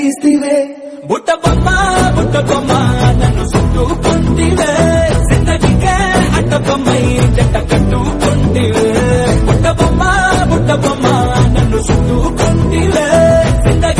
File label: tamil romantic song